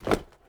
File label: drop rubber; Rust